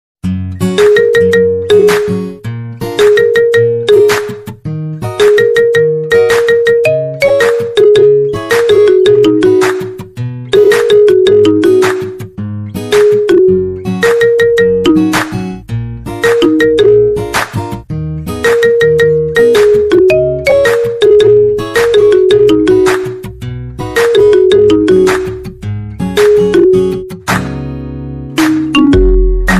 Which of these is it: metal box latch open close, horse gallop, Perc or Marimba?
Marimba